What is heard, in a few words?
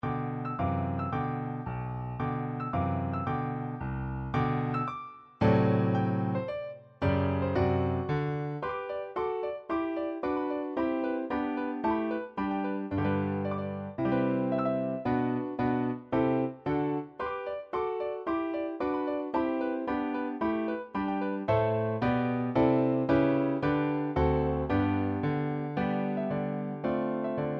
piano; 雪